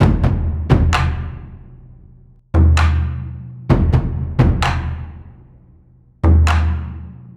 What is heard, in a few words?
Drums Cinematic War, Cinematic Trailer Loops